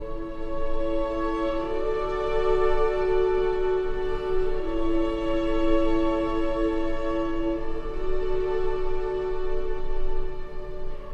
for cello and strings; Archi di Santa Cecilia

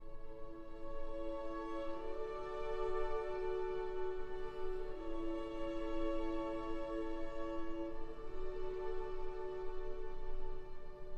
for cello and strings

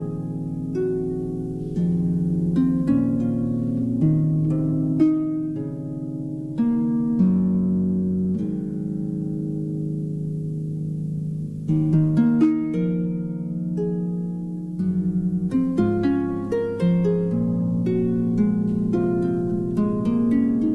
Harp Concerto Lullaby

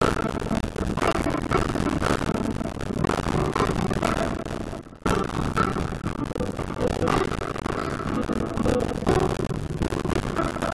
Guitar Concerto in D; Vivaldi, Antonio; RV 93; Orig. for Lute And Two Violins

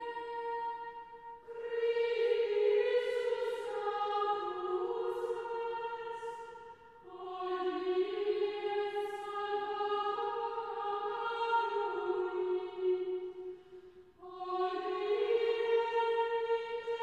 Chant Antiphon; Traditional; Chester Processional

